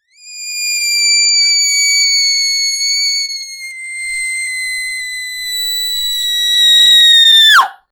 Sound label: short startled scream fro, mouse